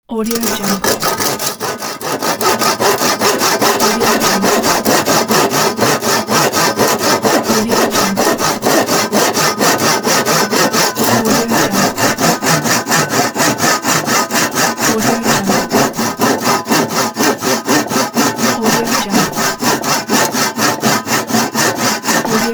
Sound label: handsaw